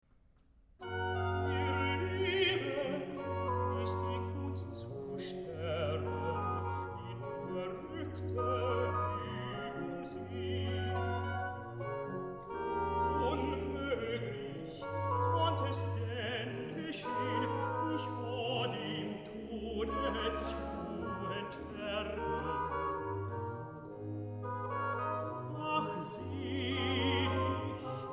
Recitative (tenor); Bach; cantatas; Lass, Furstin, lass noch einen Strahl

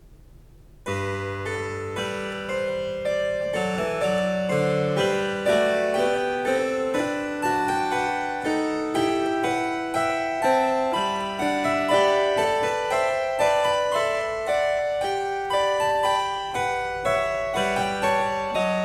Cembalo; Variatio 9 Canone alla Terza a 1 Clav; Bach; Goldberg Variations

